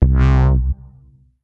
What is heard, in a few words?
Fat bass, synths